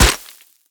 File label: concrete impact bullet; physics